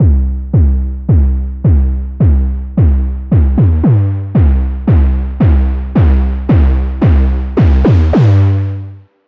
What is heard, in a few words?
Dystopia Sub, bass synth; Preset: Dystopia Sub; Wave Alchemy - Bassynth 1.1.0